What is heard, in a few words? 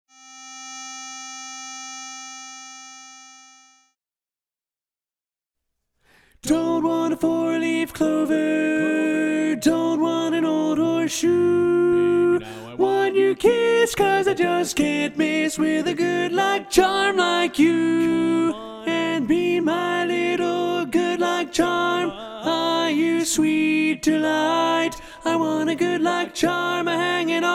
tenor predominant